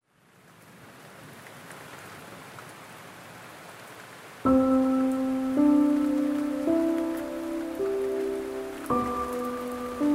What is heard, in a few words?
Sleep Music (With Rain Sounds)